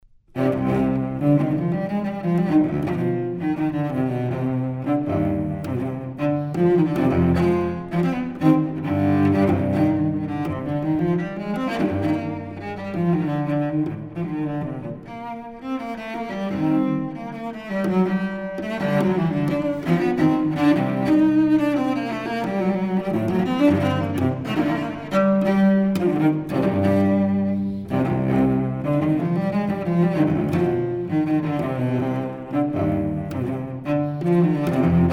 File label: Suite No. 5 In C Minor Courante, Cello Suites; Johann Sebastian Bach